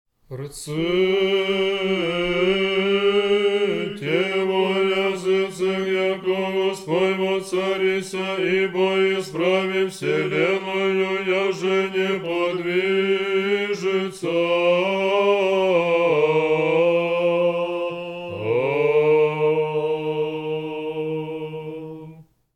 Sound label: prokimen tone 3